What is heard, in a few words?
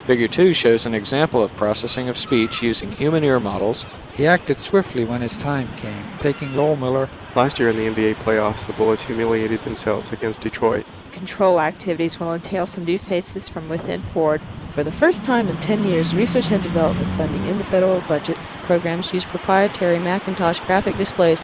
noise 10dB